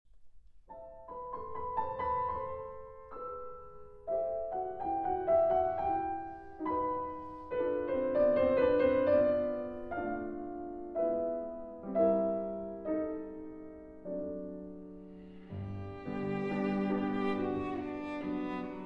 Piano Trio in G Major, Andante espressivo; Debussy; CD 5, L. 3 III.

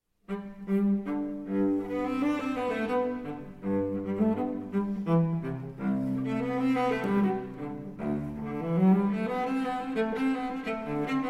Cello Suite in G Major, Courante; No. 1; BWV 1007 III. Courante

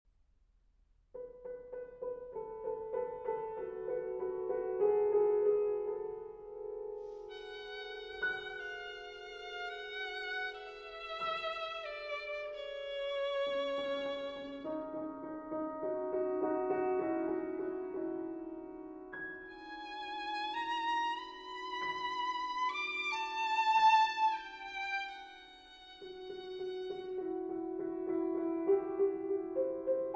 Violin and Piano Sostenuto; Sonata No. 1 For Violin and Piano, Op. 2471

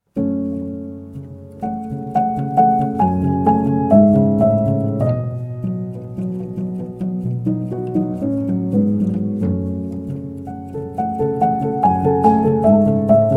Solo Piano Version

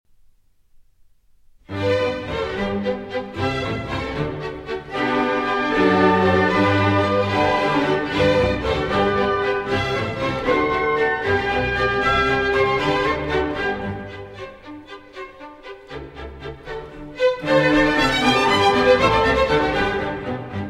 Violin Concerto in G, Rondo, Allegro

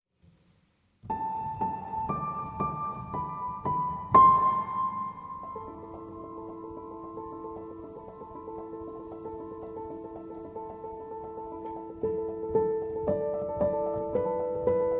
Piano Version

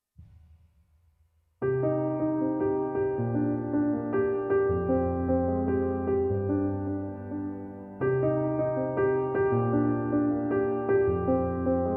Wind Song

